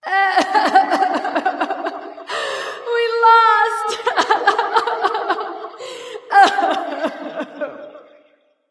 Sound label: boohoo light; smite; voicepack; hel